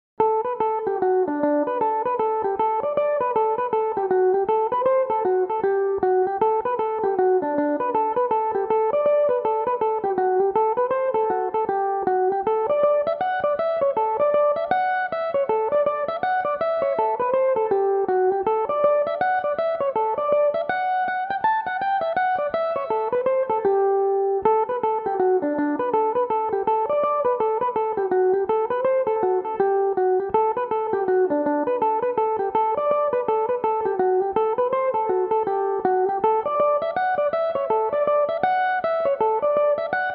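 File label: D major, mandolin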